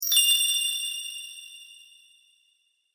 Wizard - Single Sparkle; Magic Sound Effects

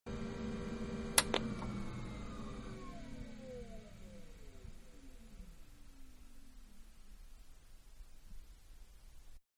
Shut Down, Computer; Computers (Sound Effects:Electronics); Manhattan Production Music

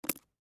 Pen Set Down On Small Note Pad; Office; Blastwave

